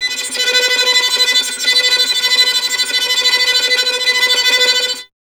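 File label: string violin tremolo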